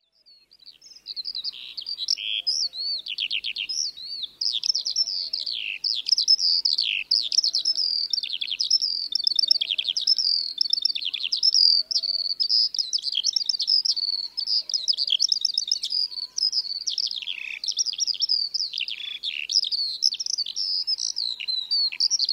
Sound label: skylark song normal